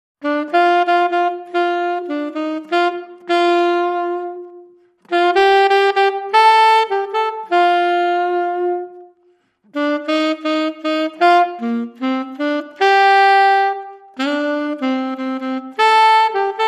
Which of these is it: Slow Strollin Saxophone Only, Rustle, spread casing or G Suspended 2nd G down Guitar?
Slow Strollin Saxophone Only